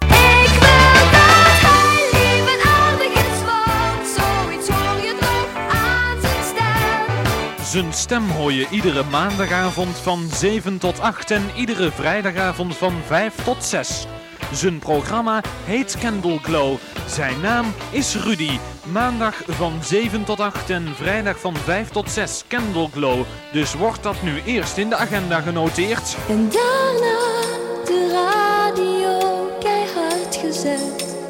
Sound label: Jingle; Allerhande